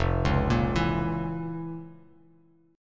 F Major 7th Suspended 4th Sharp 5th F, Bass Standard; FM7sus4#5 arpeggiated; JGuitar